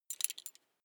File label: metal belt buckle clatter soft